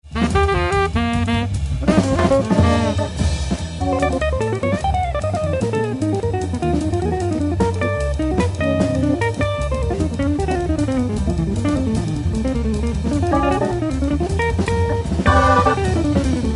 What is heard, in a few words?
Solo